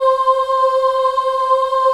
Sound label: C4 CHILD OH R, Choir; USB Soundscan vol.28; Acoustic & Synth; AKAI